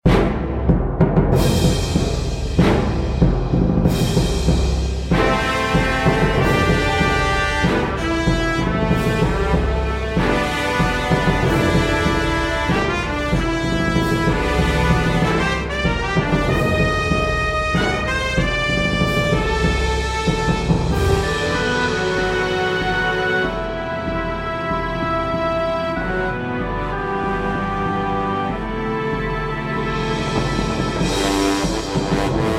Cinematic Battle Song; Battle for Sea 3D

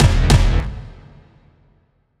Fight End; evacuation; windows gamerip 2023; Camp

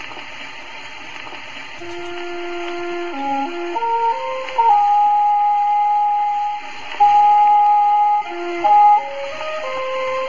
Old Music

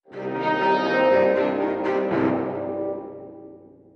Level Failed; Jim is Moving Out

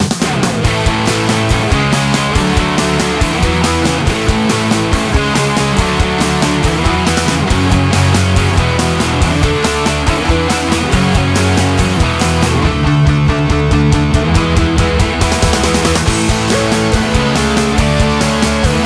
ambient loop; brooktown high; playstation portable psp

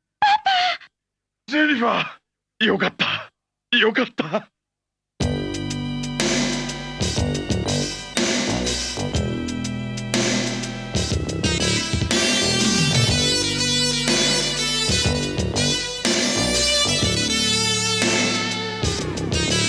All Clear; Special Criminal Investigation; S.C.I

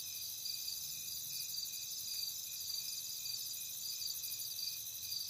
Amb Night layer

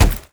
land wood

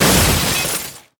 Cage Break

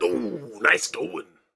punkcroc pain; Fight Knight

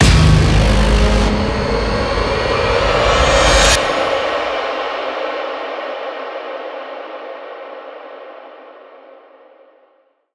Game Over

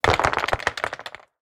Combat Blocks; portal quest android

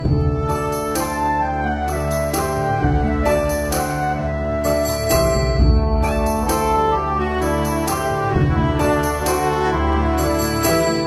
Main Menu Loop; Pixelus

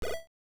COIN; video hustler frogger arcade

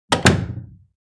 Door close

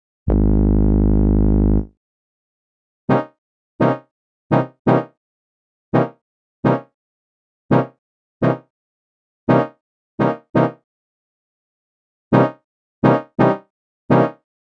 Booktoss

